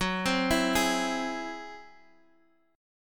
Ab Augmented 7th Gb, guitar

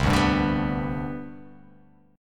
C Major 7th Suspended 2nd C down, chord; CM7sus2 strummed; JGuitar

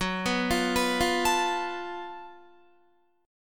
Gb Minor Major 7th Double Flat 5th Gb Guitar; GbmM7bb5 arpeggiated; 14,14,15,16,17,16; 4E,4A,5D,5G,5C,6F

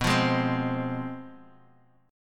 F Minor, B; Fm/B strummed; JGuitar

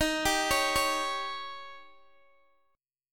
D sharp 7th D sharp, Guitar DADGAD

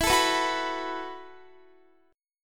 E Suspended 2nd Suspended 4th E, down, Guitar; Esus2sus4; strummed

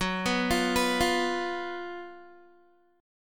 Gb Major 7th Suspended 4th Gb Guitar

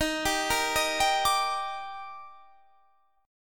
Eb Major 7th; EbM7; arpeggiated

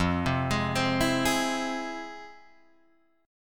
F Minor Major 7th, F, Guitar, Open G

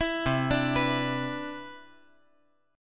C Major 9th, C, down, chord

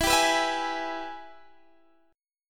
Minor Major 7th Sharp 5th; Gsharp; E; x,19,17,16,17,16

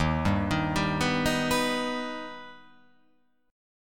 D sharp Augmented D sharp, chord

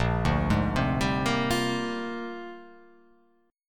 Bb 13th; Bb13 arpeggiated

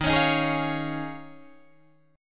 D sharp 9th Suspended 4th D sharp, Guitar Drop D

